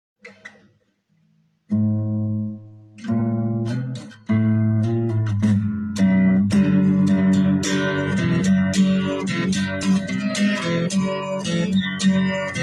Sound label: guitar